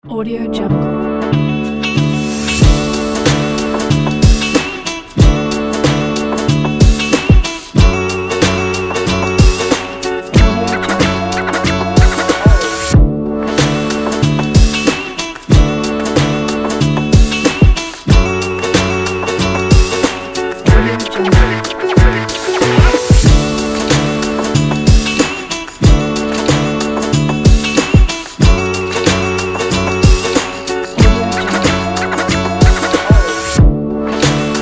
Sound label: hip hop sport vlog; creamsound